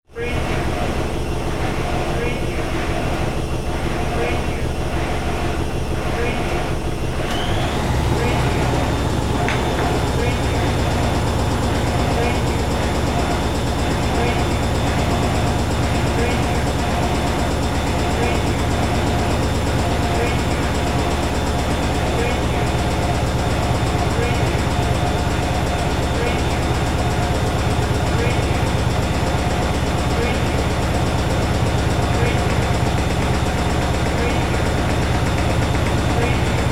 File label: washing machine